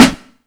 bassy snare sound c key, tuned drums c key

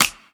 smooth clap single shot d sharp key; percussion; single hits one shots

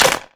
hand clap c key; percussion; single hits one shots

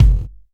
low tone steel kick drum g key; kick drum samples

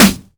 sizzly snare drum g key; snare drum samples

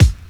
natural bass drum sample d sharp key; kick drum

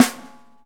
reverb steel snare drum b key

